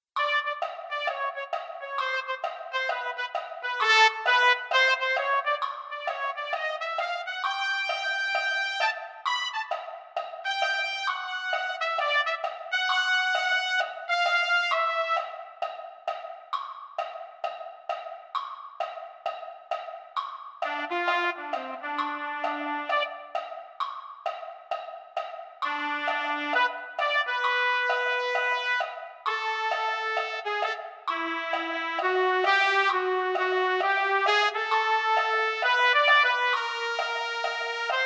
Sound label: trumpet; concert corps